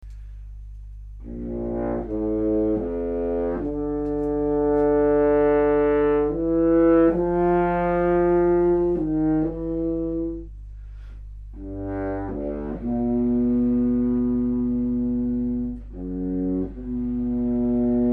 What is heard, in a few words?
oct down, horn; gordon